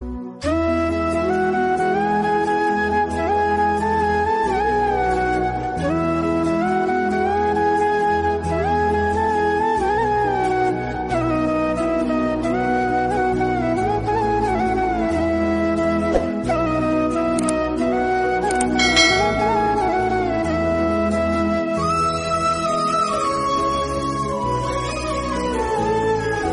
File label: JAI GANESH JAI GANESH JAI GANESH DEVA INSTRUMENTAL RINGTONE